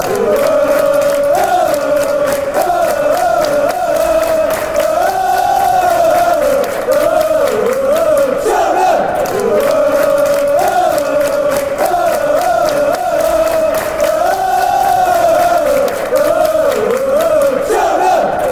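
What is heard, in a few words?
fanchants, football chants; cheltenham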